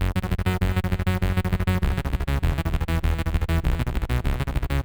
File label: bass arp